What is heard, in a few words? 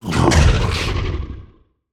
Humanoid Impactful, Monster Sounds; The Decline Of Warriors; Monster Sounds Volume II